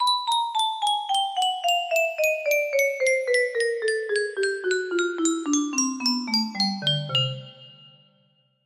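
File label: scale, music box melody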